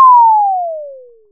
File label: E-Perc Sine Sigh, Electronic Percussion; Drums; Ableton Live 12 Lite; Core Library